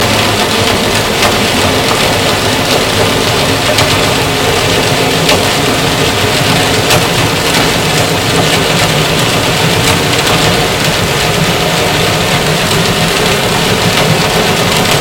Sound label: Hydrophones, orcasound